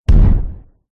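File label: science fiction explosion puff smoke medium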